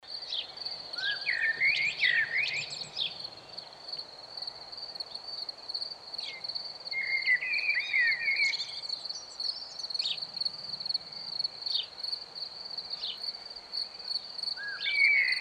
Voegel Amsel Abend Grillen Zirpen Sommerabend

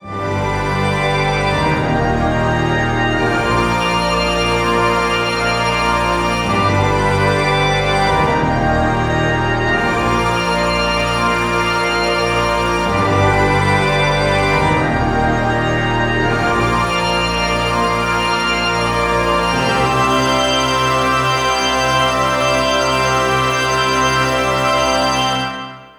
Orchester Episch B

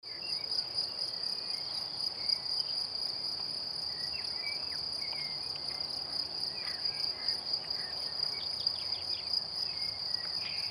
Zirpen Vögel Laufen

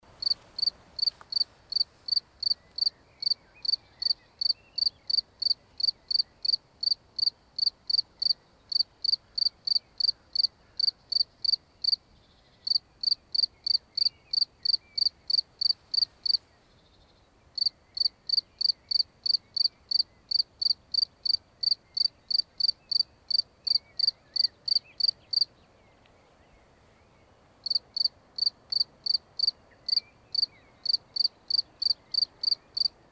Einzelne Grille Voegel

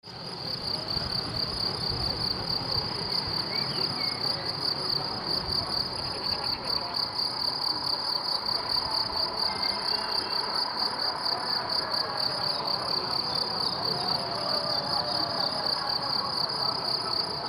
Vatertag Zirpen; Spass

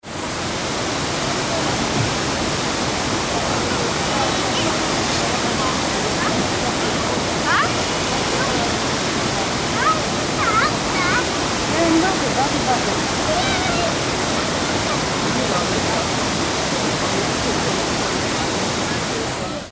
Gljufrafoss und Stimmen

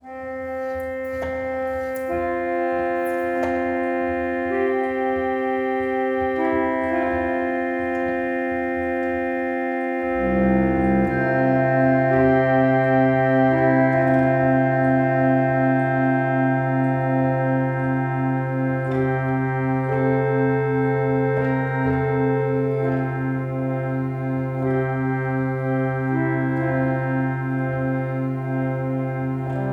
Folk Harmonium, fröhliche Flöten Musik; Orginal Harmonium Packard Bell (1890). Folkklänge, Soloversion 1.

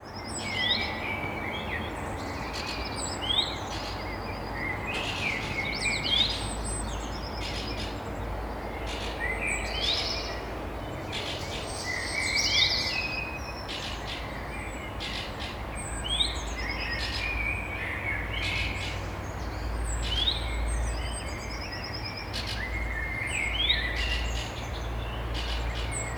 Voegel Morgendaemmerung

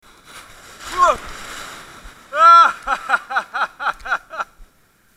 Lachen Mann Kalt Welle

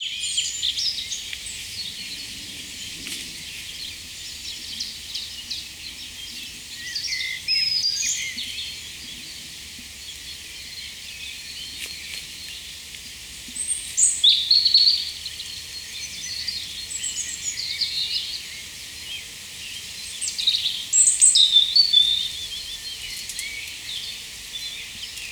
Obstwiese Voegel

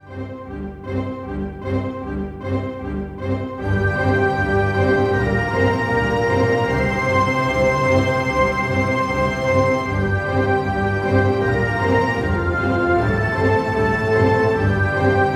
Orchester Verlaufsmusik C

